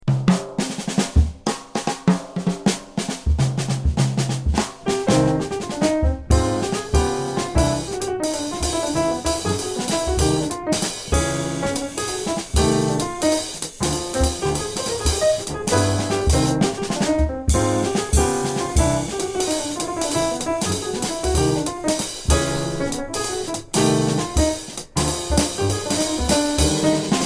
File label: minus Bass